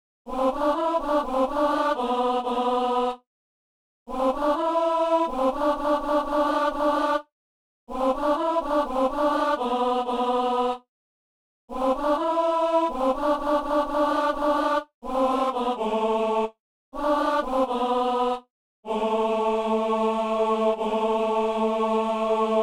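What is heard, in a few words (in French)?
ténor; Messe de Saint-François-Xavier - Gloria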